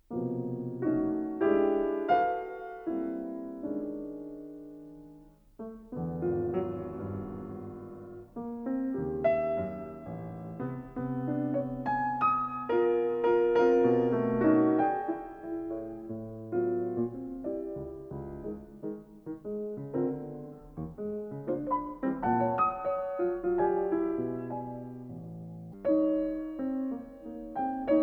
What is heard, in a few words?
Piano Piece